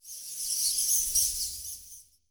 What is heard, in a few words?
Ambience bats; adams venture origins